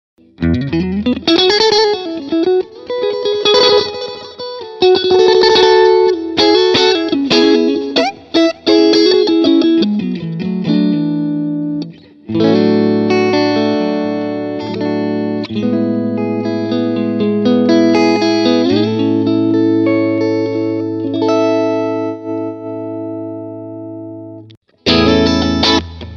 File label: beef n bone ceramic single coil git